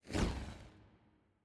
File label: superweapon initiate; ships; biogenesis; kaiju